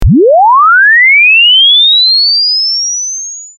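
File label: sine sweep 0-20K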